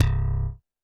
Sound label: Roland TD-20 Percussion